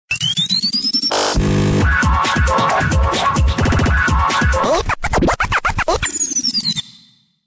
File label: crazy scratch mix